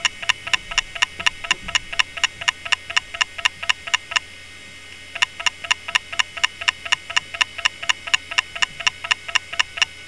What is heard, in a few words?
Excessive Clicking, data recovery sounds